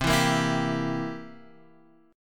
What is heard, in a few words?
C 7th C down Guitar Open F, C7 chord; strummed; JGuitar